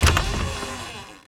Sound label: door trap wood open